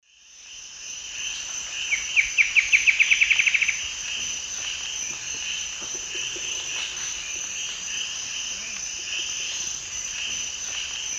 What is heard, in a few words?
Herpsilochmus dugandi, song; S. America; Eastern Lowlands